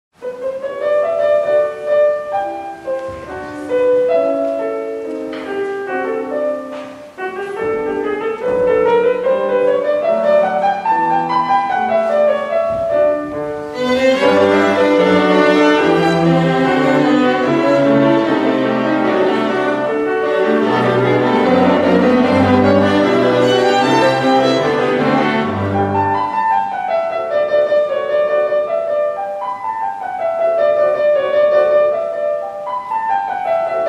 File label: Klavier Quartett KV 478 Rondeau; Mozart